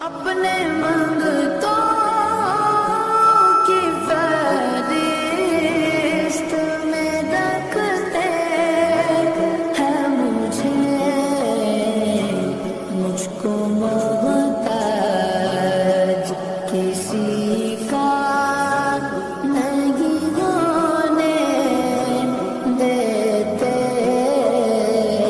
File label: Naat Ringtone